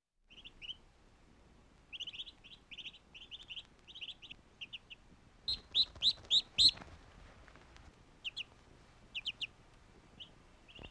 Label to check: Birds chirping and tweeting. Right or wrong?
Right